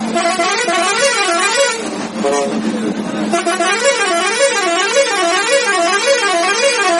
Bus Horn India

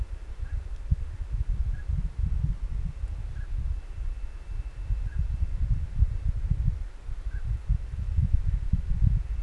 Northern pintail

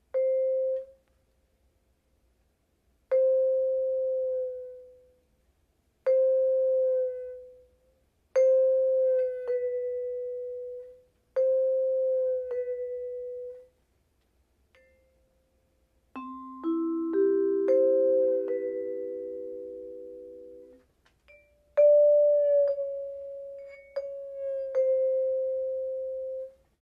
Vibraphone

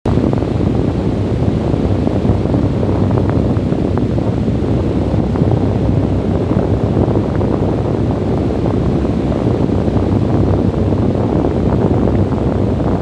strong desert wind

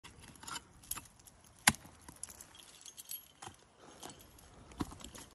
climbing; کوهنوردی

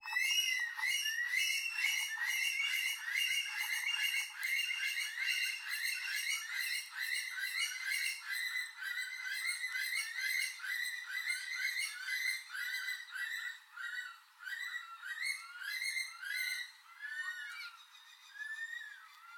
Bush stone curlew

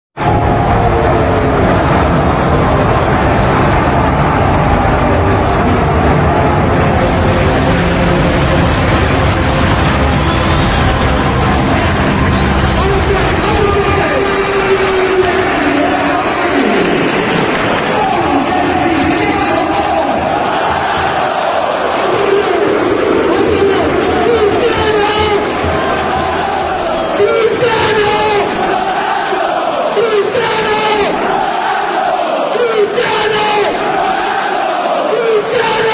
Santiago Bernabéu Stadium; ورزشگاه سانتیاگو برنابئو

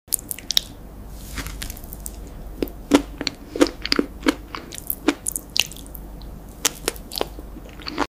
Chewing fruit

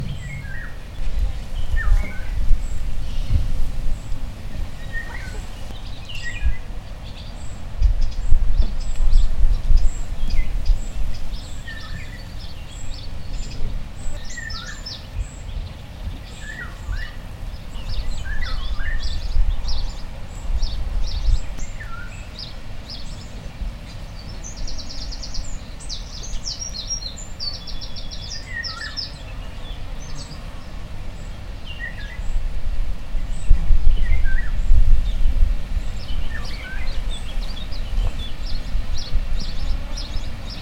Eurasian Golden Oriole

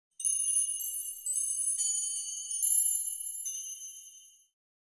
starfull

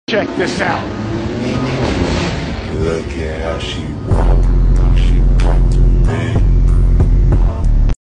BMW i8; موتور

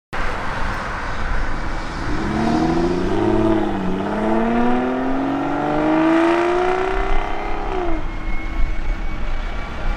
Aston Martin, اگزوز

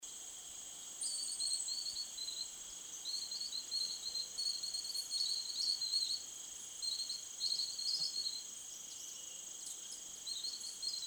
Green throated Mango, پرنده

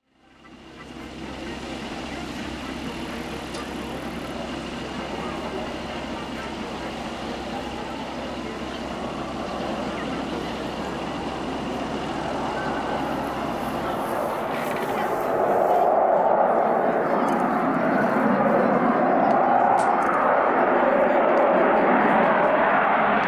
MiG-29, جنگنده میگ 29

